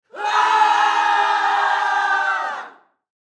Human Crowd Studio; Crowd Reactions Male Scream Ahh; Blastwave

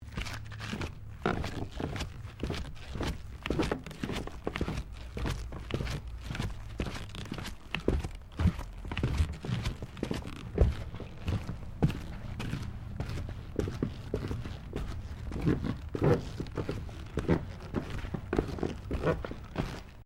Footsteps Stairs Wooden Carpeted Sequence; Crocs; Footstep Foley; Human

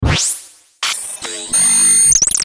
Stinger Power Up; Production Element Imaging Element Accent Transition

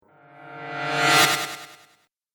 Magnetic Magic, magic sound effect; Role Playing Game; Andrew Fleming

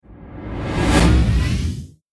Cinematic Reverse Whoosh; Whoosh Sound Effects

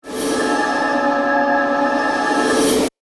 Stab Suspense, Danger, Sting; Stab Sound Effects; BlastWave

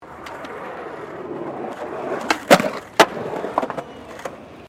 SKATEBOARD FLAT JUMP; Skateboarding; Sports

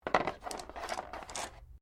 dice medium cardboard box pickup; Variety of dice sounds; Casinos

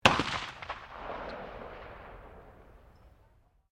RIFLE UNKNOWN FIRING DISTANT

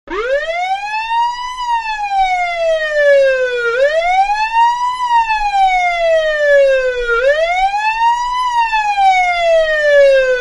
Police Siren; Sirens; Electronics; Manhattan Production Music

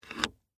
Metal Handle Down; Foley; Machinery; Machines; BlastWave

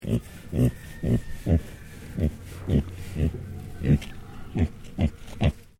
Animal Pig Tamworth Grunt Sequence; Hamish; Edgar's Mission Farm Victoria; Pigs; Animals

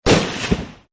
AK47 Shot; Assault Rifles; Guns

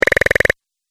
Woodpecker Game Fx; Video Game Sound Effects

